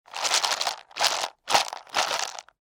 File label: CONTAINER PLASTIC